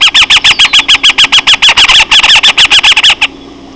busard

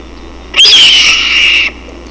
buse à queue rousse

